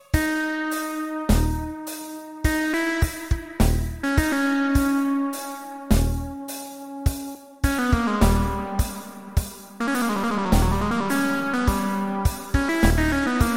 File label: magnetic lead